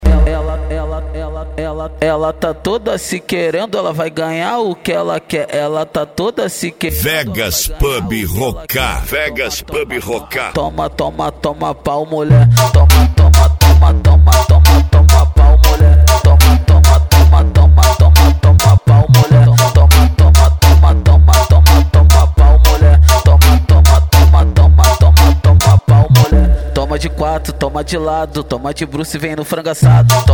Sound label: funk mega bass